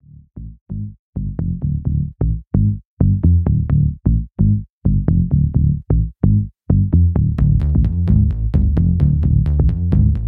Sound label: Electronic Countdown; unfold